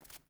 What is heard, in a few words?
footstep barefoot concrete walk; Rust